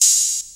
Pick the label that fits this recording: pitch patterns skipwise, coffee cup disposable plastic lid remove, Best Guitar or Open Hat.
Open Hat